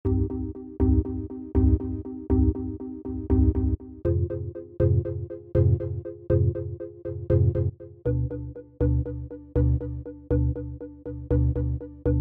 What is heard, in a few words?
fantasy love tango